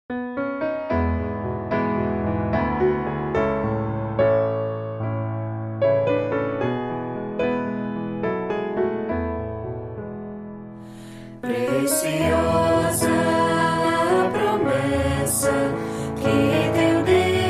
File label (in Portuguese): voz piano